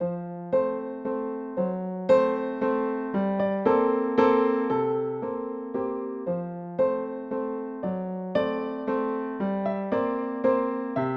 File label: Waltz; PDMusic Volume 1